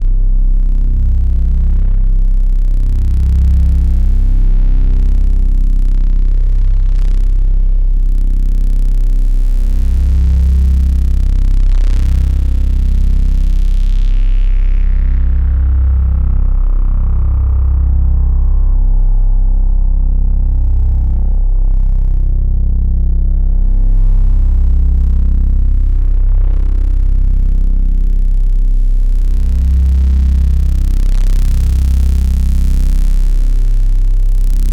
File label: Textural Soundscape, IDM